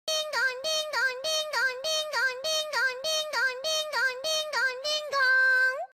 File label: anime alarm